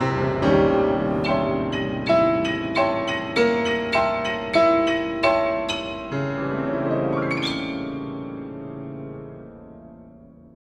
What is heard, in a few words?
Steinway